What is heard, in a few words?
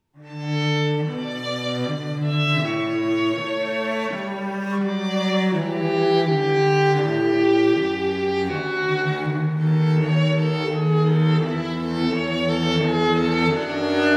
String Duo